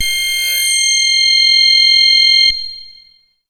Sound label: MASS LEAD, SYNTH; Keith Emerson Signature Series